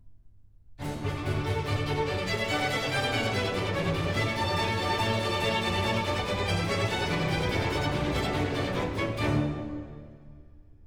Sinfonia; Act I; Handel; Ottone, re di Germania; Ottone, HWV 15

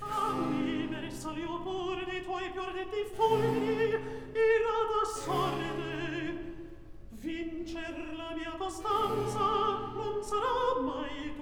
Recitativo; Act One, Scene 4; Fammi bersaglio; Floridante

